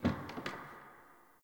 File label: Bar Wooden Chair Move; Warframe; Nineteen Ninety Nine; Hub; Hub Pub